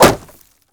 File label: Bullet Impact Wood